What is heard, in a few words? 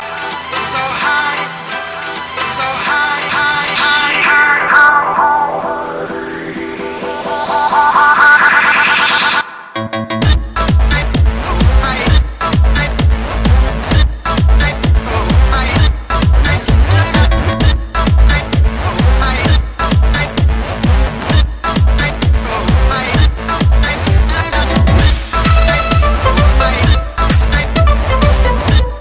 Dj Music